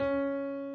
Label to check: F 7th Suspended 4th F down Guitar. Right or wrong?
Wrong